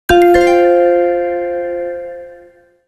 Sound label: New Email